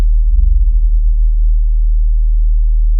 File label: Sound of an owl